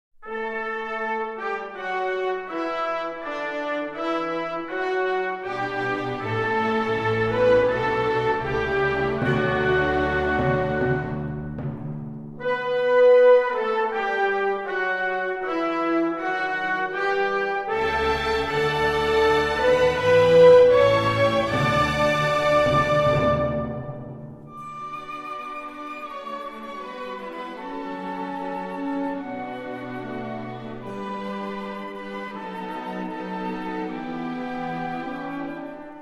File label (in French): Hymne national Norvege; hymnes